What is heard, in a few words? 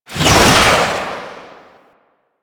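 Sniper Alt Fire Projectile Whiz By; DE; Warframe; Frumentarius; Exalted Sniper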